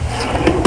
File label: Map up; Florida State Football - Ultimate Multimedia Experience